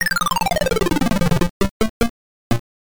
Death; Slider Game Gear

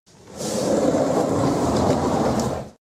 Bookshelf Move Sound

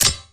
Bomb Bounce; Freedom Fall

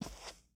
Foot Carpet Shoes Walk

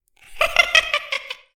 hahaha; don t be afraid the first toy

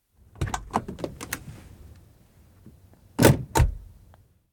Car Doors Open and Close x 2

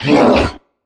troll monster attack fast; Infernal Radiation

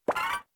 Bubble; Garfield's Diner; Android game music

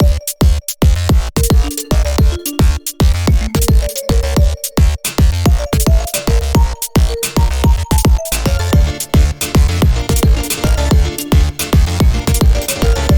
Instrumental; ourple guy garten of banban mix; windows

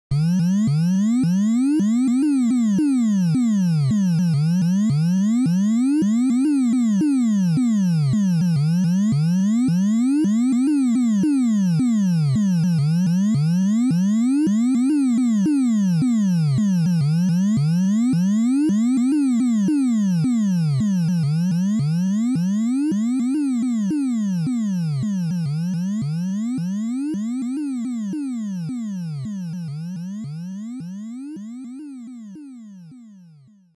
In-Game Ambience; Galaga

